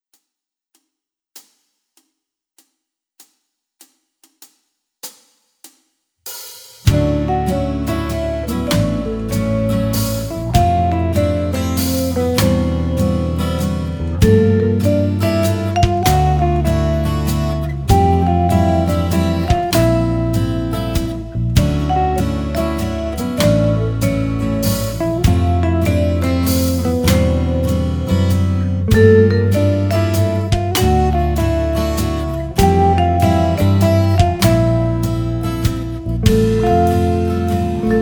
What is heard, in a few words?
Falling Leaves Music For Trees